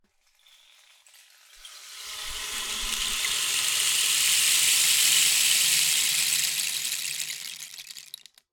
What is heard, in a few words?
Rain Stick, Percussion Samples